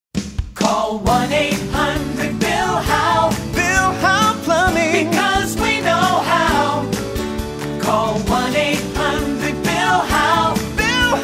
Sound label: Jingle; Original Bill Howe